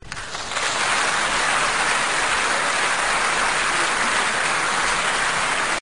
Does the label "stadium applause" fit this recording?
Yes